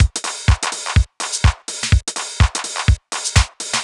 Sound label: drum clapper full 125, Classic Chicago House Drum Loops